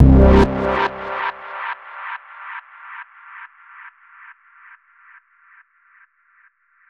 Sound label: Bpm139 Bass Loop D; Drained; Prime Loops; Dubstep Illusions